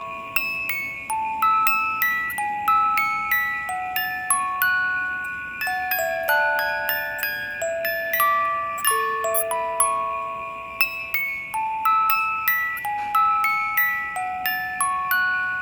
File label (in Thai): กล่องดนตรี